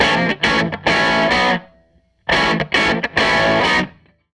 SG Guitar Loop

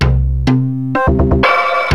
KORG WAVESTATION

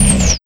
Jungle Kick

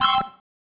Stab, Organ, One Shots

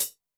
closed hihat, Zoom MRT-3B

